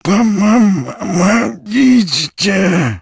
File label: death; STALKER; customnpcs; killer